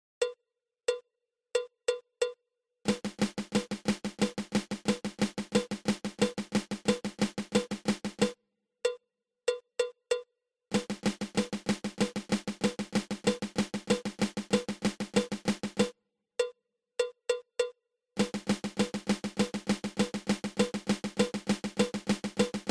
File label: Flam Tap